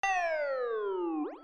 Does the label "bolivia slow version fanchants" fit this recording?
No